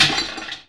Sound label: Metal Glass Bits